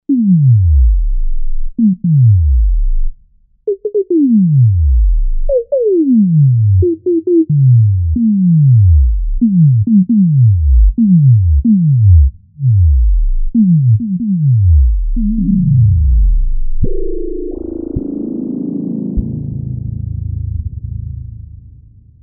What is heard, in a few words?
Pop Breakdown, Novation UltraNova; Factory Patches